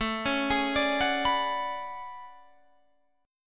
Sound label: A Major 13th, down Guitar Half Step Down